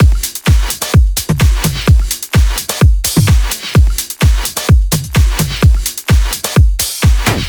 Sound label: 128BPM Kit; VFH1 128BPM Bones Kit